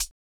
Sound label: HIHAT